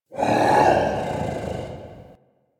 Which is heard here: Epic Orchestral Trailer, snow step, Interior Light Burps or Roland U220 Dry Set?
Interior Light Burps